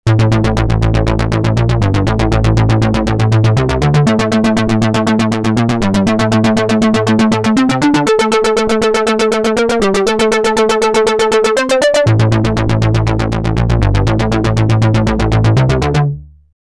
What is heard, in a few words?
synth